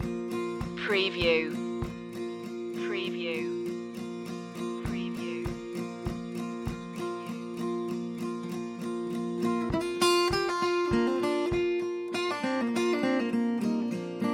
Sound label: barnwood banjo loop